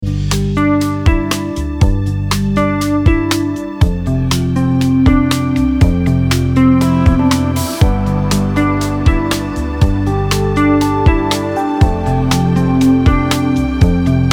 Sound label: old scool smooth jazz; smooth jazz guitar; audiolounge soft